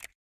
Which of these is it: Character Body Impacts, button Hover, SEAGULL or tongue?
button Hover